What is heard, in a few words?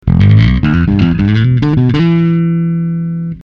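fingered, Bass Driver D.I.; sansamp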